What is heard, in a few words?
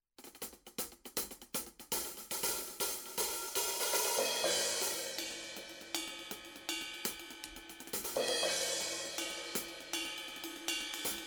sE7 OH Cymbals; sE Electronics; sE7 vs. sE8